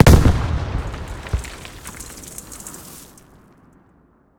med close, explosions; gmod; lvs framework

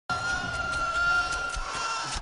screech, acklay; valkyrie npc pack; fallenlogic

